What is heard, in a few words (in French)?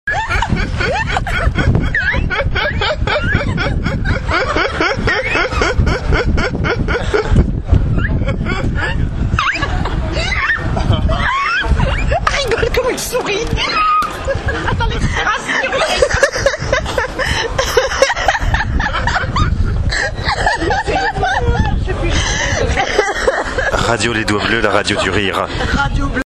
rires